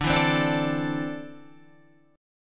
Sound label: D 7th Suspended 2nd down Guitar Standard; D7sus2 strummed